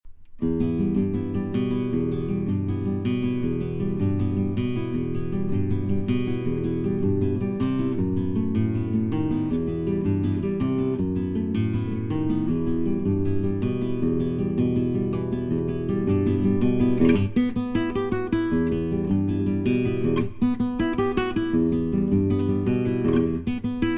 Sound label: Rumba De Granada